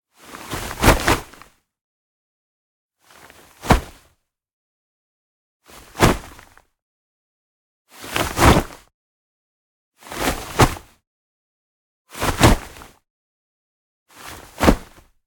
Cloth Foley Jacket Synthetic Soft Shell Whoosh Flutter; Systematic-Sound; Sound Themes; Modern Cloth Foley